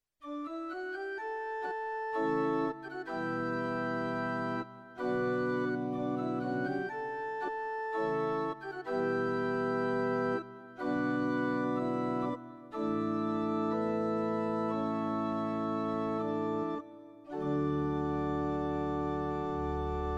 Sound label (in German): P255 Orgel